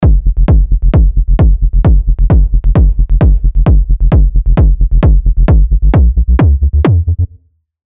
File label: Drum Bassdrum; SERUM